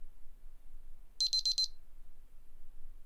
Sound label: Timer